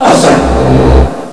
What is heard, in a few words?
wpn pickup